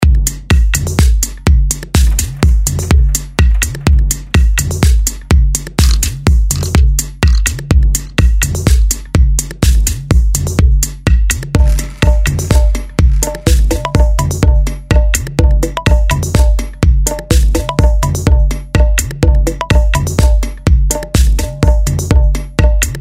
bongo shot

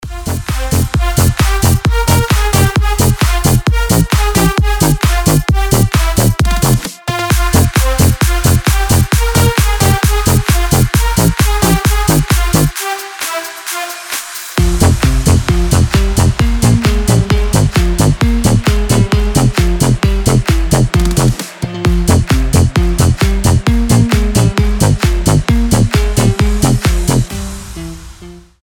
funny style

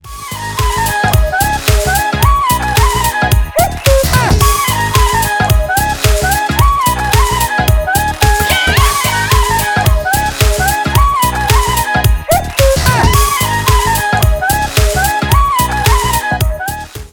instrumental

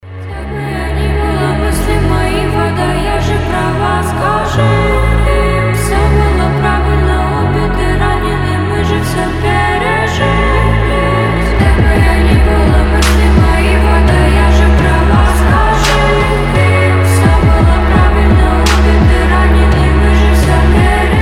slowed reverb

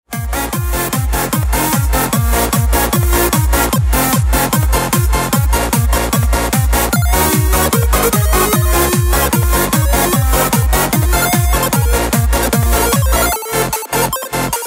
techno remix

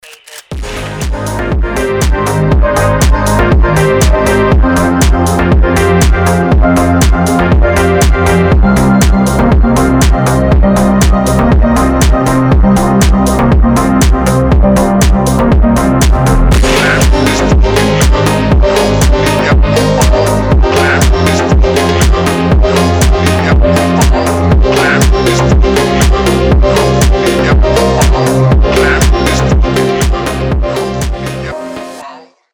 street phonk

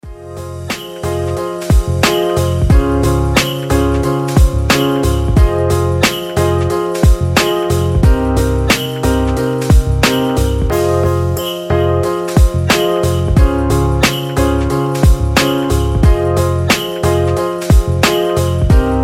lofi christmas

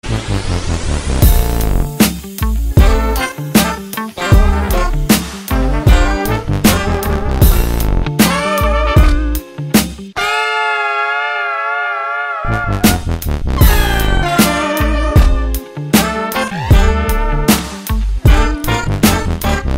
riser

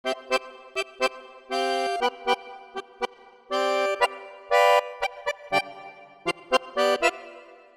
wind dance; huawei p9

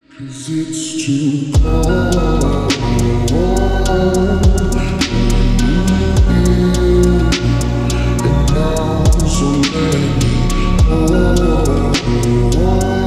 slowed reverb

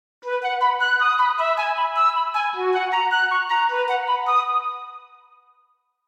huawei p9 morning glory

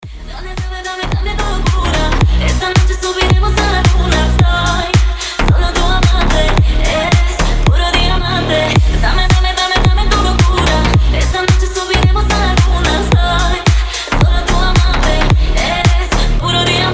spanish

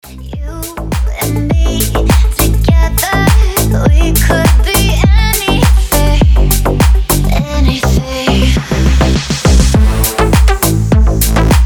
techno

